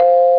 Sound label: huh; MPG-Net (USA); garden